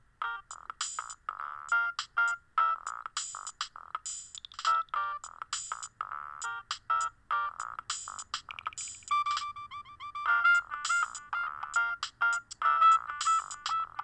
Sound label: Trip Hop